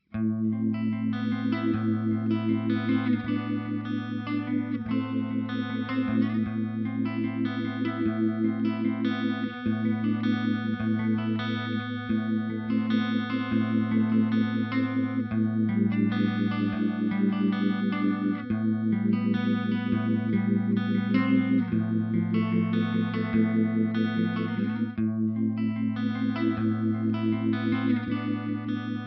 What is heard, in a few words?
GTR Uni V, On electric guitar; pitch-shifting, chorus and frequency-dependent tremolo